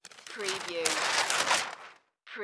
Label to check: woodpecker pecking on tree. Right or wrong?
Wrong